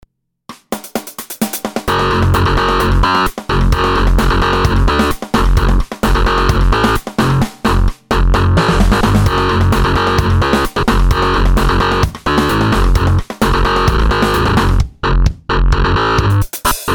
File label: bass git